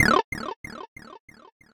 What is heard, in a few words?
fire large; sfx; cosmic protector